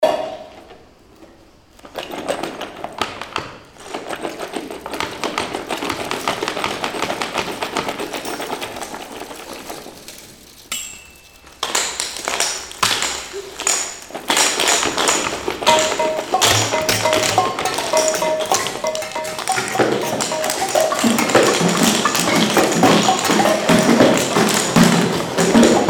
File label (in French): mini orchestre